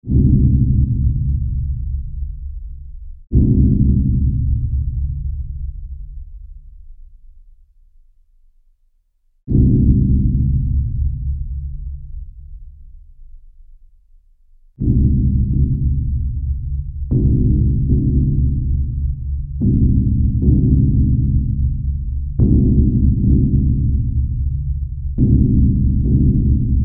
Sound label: ship echos mult